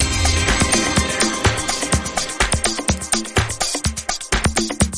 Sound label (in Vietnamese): khong loi sony xperia z dancingfool